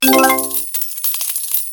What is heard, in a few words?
coins; Virtue-Slots